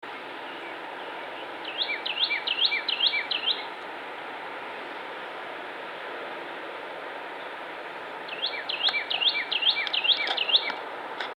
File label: Tea kettle